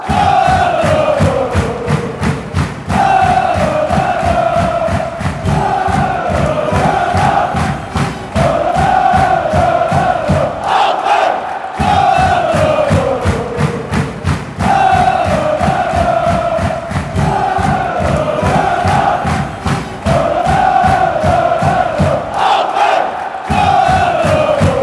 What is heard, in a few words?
schalala fan chants